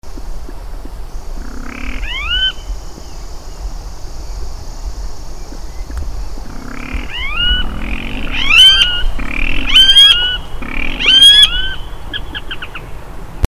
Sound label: Pardirallus nigricans; Itamonte; MG; Brazil; Silveira